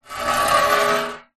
foley metal large frame scrape drag screech concrete